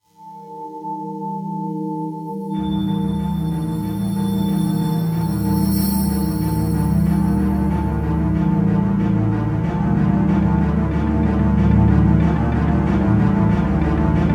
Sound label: Countdown; Monkey Jumble